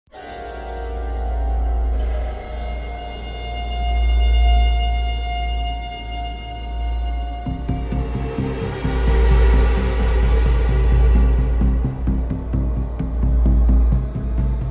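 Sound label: Hell, Dark horror background music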